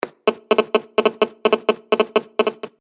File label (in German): Handysignal 1800Mhz